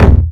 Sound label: Dup, Kik, One Shots; Sonic Foundry (Sony Creative Software) - Future Retro Dance Excursions